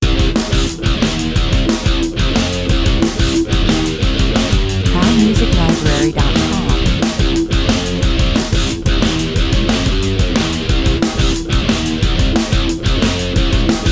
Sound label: hard and heavy 90 bpm, Hart und schwermetalig